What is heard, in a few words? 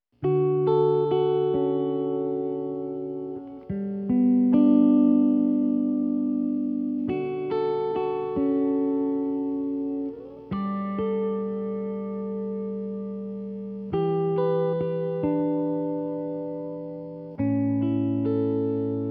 Luv Guitar; School 2021; OST; Korea